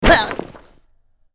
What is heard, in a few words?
Character Yellow Hit; Angry Birds Epic